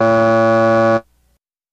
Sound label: Buzzer Elect Game S, electronics